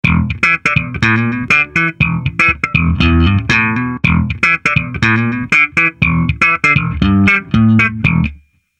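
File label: Fame MM 400, guitar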